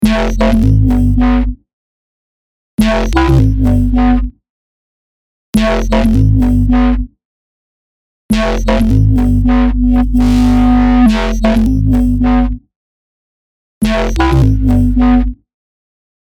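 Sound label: 174 bass loop, Drum & Bass; The Bass samples were created using Xfer Serum and many feature additional FX processing outside the plugin; Tech Flavours; Drum & Bass Sample Pack inspired by the sub-genre of Tech Step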